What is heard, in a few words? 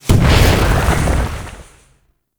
Sonic Dissipation Proj Explosion; Warframe; Focus; Vazarin